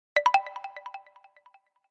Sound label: Message, notifications; Qiku; Luna Youth